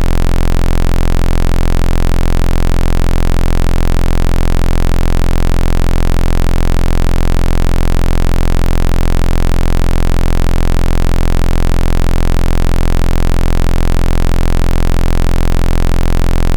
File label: fan